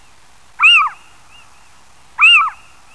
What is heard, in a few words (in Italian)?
civetta, canti uccelli; mondo montagna